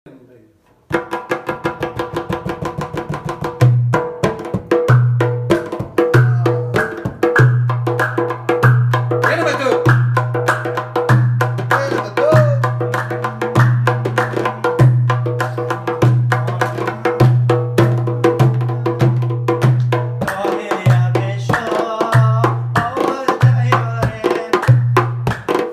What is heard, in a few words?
Tabla